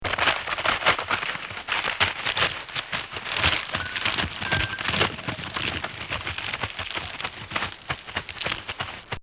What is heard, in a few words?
Crunches And Cracks; Objects